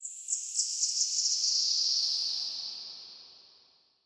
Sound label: Bat Chatter Distant; Ambience; Dungeons; Under City